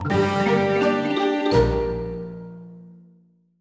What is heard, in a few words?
Music Sting Match, pinball